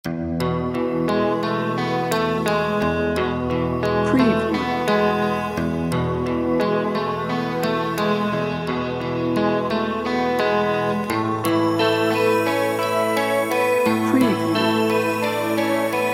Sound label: rain on the roof bed